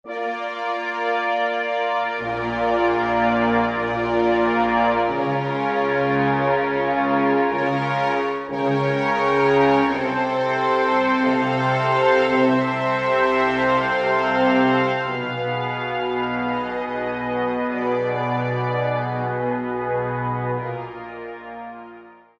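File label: Full Brass, Roland SR-JV80-02 Orchestral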